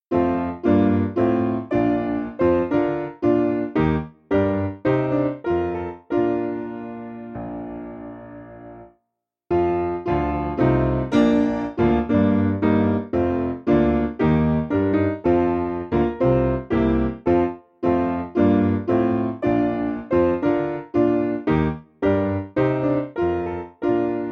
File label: O Lord Of Heaven, Portland, Piano; 6/Bb